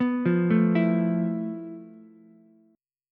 F Major 7th Flat 5th Ukulele; arpeggiated; JGuitar

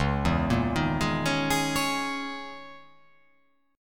C sharp Minor 6th Add 9th C sharp

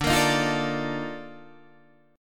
A sharp Major 11th D

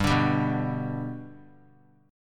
G Major down; G strummed; JGuitar

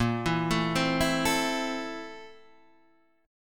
A Minor Bb, Am/Bb chord; Am/Bb arpeggiated; JGuitar

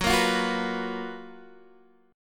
Gb Major 11th, down, Guitar, Drop D

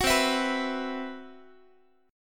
C Major 7th Flat 5th C, Guitar; CM7b5 strummed

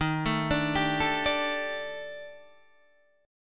Eb Major 7th Flat 5th Eb Guitar DADGAD

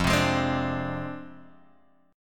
F Minor 6th F down Guitar Open F; Fm6; strummed; JGuitar

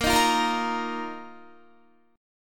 A sharp Suspended 4th Guitar; A#sus4 strummed

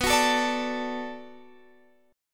D 6th B, chord

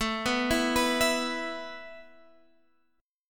A Suspended 2nd down Guitar Drop D

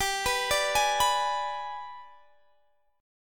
Ab Minor Major 7th Flat 5th, G

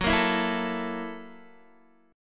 F sharp 7th Sharp 9th, Guitar, DADGAD

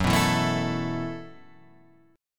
E Suspended 2nd Suspended 4th Gb

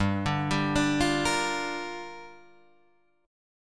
G Minor 7th, Guitar, DADGAD

